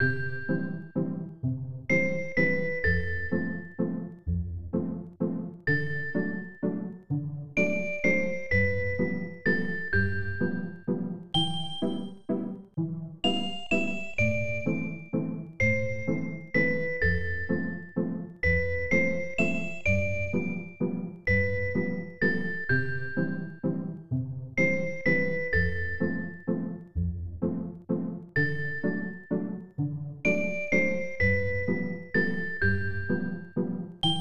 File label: Industrial Information; BS Dan Dan Belt Conveyor Milk no Maki; SNES